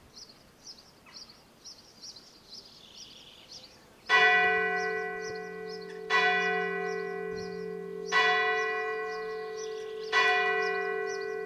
douze coups midi st suliac

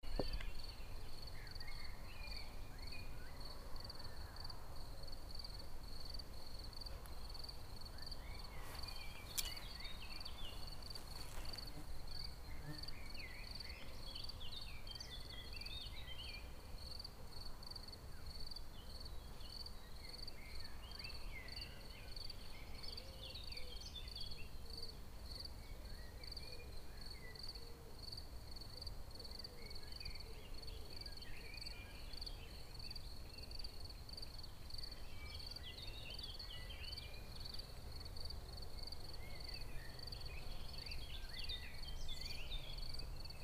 prairie 19h; poisy

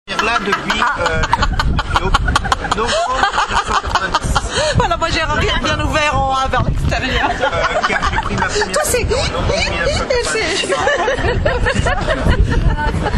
rires

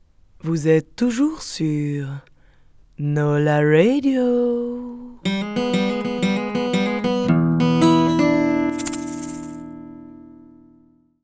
JINGLE LIVE

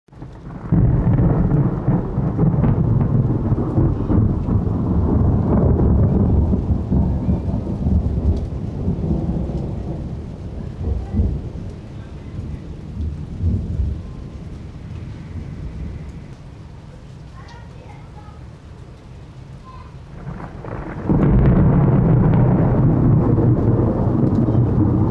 orage

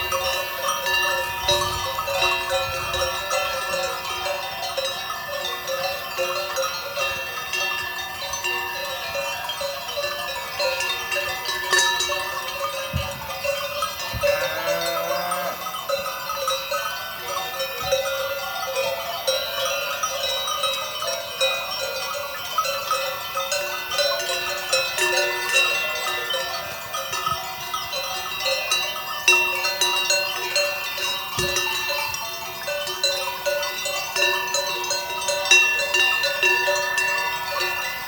cloche

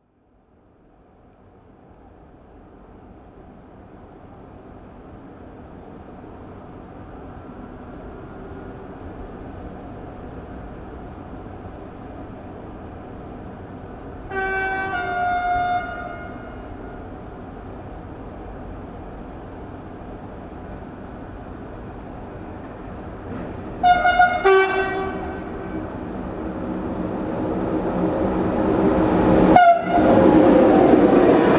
Train des lumieres

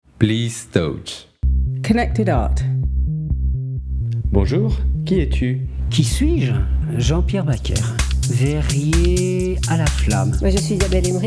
groove electro

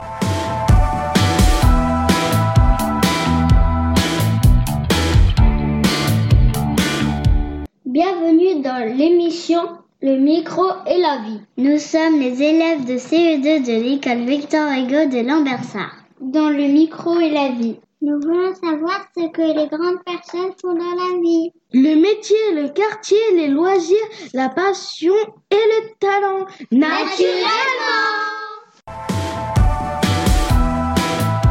jingle

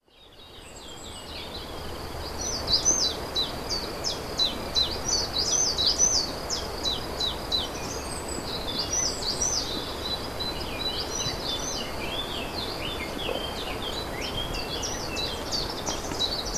mesange qui s applique

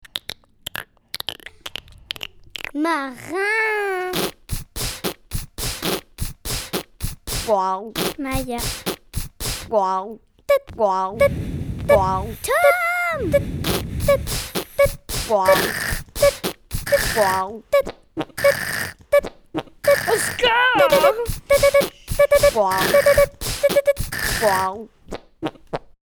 Bruits de bouche

